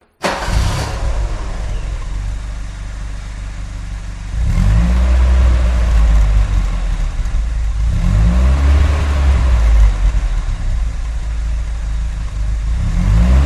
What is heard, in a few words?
EXT engine start revs reverberant; detunized